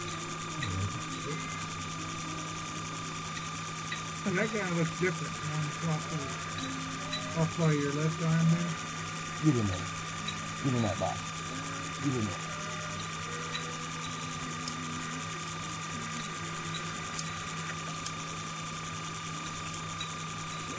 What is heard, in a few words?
squid attack